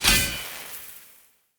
Sword Throw Loop Recall End; Weapons; Player; Swords; Fenn Jotar Sword

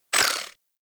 Pistol Proj By; Guandao

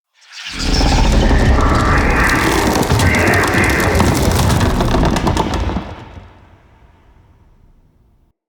Wevetwood Tree Creak Speak

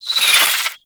Bow Arrow Rain Whizz By G; Ultimate Abilities; Madurai; Warframe; Focus

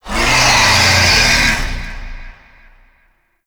Pirate Kraken Call; Warframe

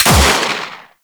Revolver Pistol Shot

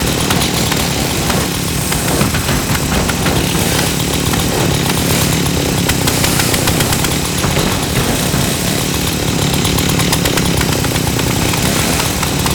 Chain Saw Tonfa Idle Loop

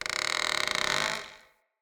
Tree Pine Branch Creak; Trees; Midrath Island; Ambience

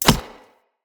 Throwing Knives Impact Wood; Weapon Impacts; Environment

